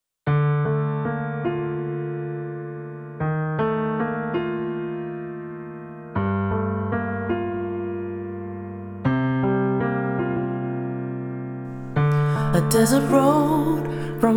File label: VOICE GUIDE